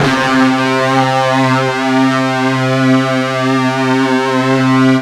FUZZ C 2, ULTRA FUZZ, Guitar; East West; Steve Stevens Guitar Samples Collection

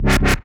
C Bass, DnB One Shots

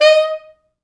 Pop Brass; Best Service ProSamples vol.19; AKAI

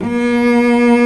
solo, cello orch; Roland LCDP13 String Sections; STR Combos 1

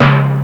TIMBAL; TIMBALS; Ragga & Dub Reggae

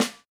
RX8 SD, Yamaha RX-8

